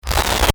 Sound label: short static whistle